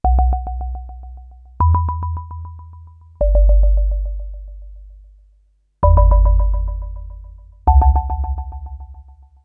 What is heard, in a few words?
Modal Argon8